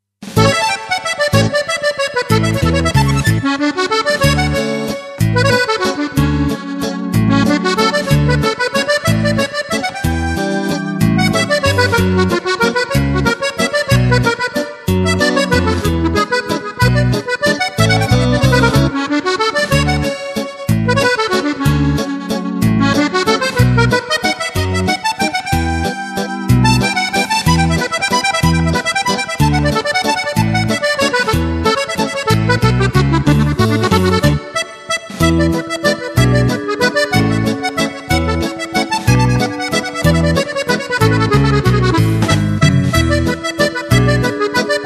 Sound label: accordeon